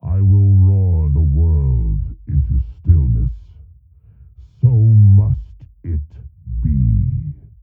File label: Beefy Bass Layer Only